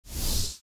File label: Swoosh Transition